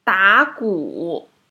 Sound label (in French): jouer batterie